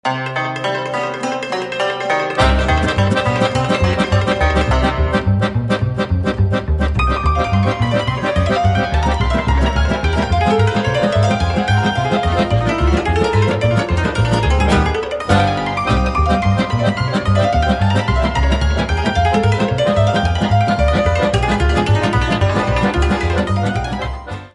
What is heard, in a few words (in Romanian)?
Sirba de concert